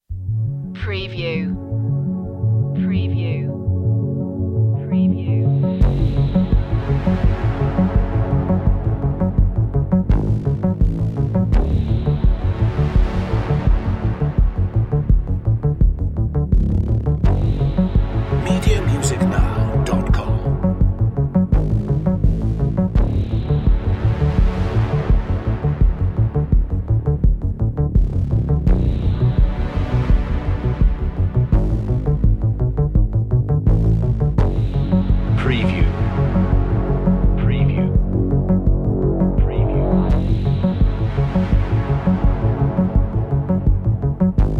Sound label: bizarre stuff underscore